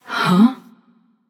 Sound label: confused passion; chaac